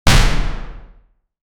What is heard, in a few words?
short sound that alerts; sound effect